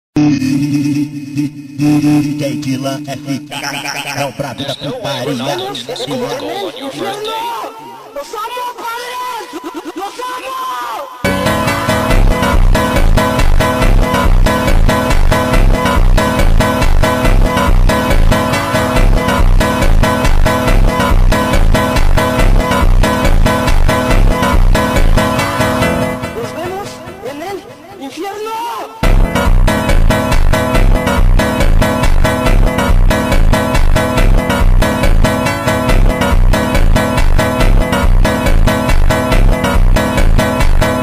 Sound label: Phonk